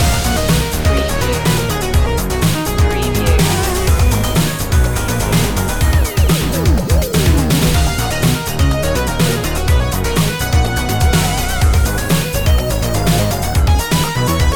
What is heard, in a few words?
industrial zone loop